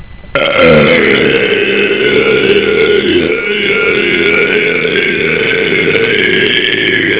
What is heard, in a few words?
EAEAEAEA, Rutti